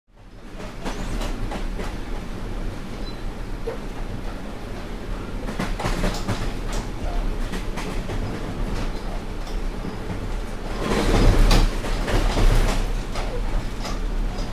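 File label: Interior Commuter Train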